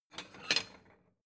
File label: plate small take slide